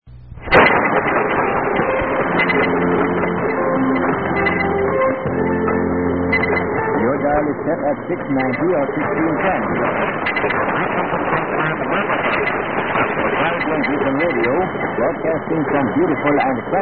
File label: Carib Radio Beacon 1610; Anguilla